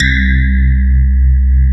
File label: SPARKLES; Wizoo - Powered DX